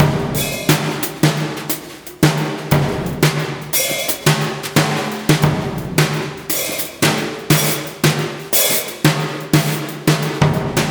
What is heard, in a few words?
Brush Kit via DBX 160sl